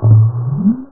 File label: saucer; ParadroidII